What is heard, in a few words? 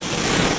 Blow torch